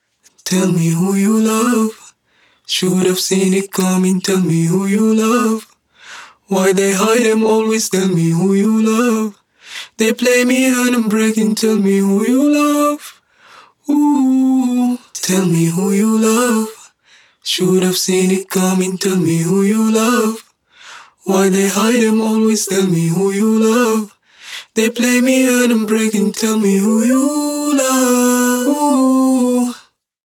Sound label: acapella, Pop RnB Dance Em 130